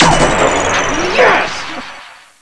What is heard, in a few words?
Get Last Key; Monkey Shines 1.2.1